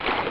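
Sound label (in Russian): вода короткий шумный приглушенный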